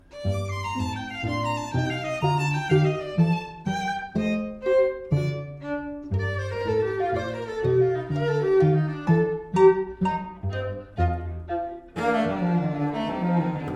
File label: trio